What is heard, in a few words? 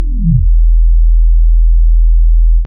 Subfrequent E2